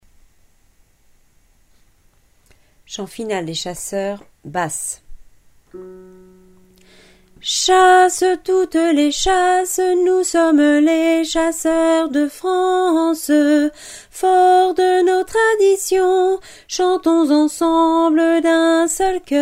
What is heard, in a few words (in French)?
hymne des chasseurs basses